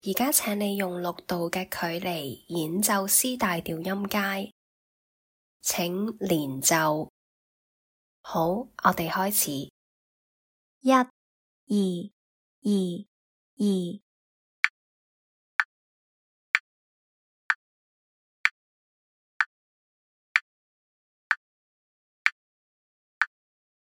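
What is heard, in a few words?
C major scale 6th apart legato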